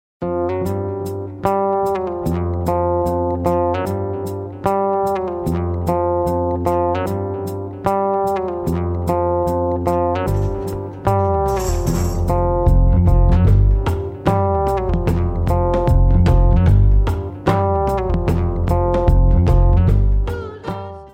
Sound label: sony ericsson ringtone